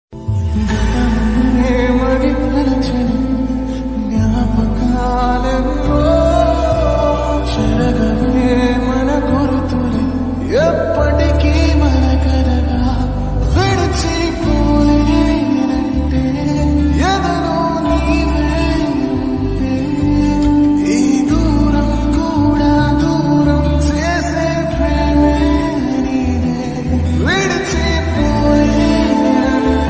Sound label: sad bgm love sad bgm ringtone bgm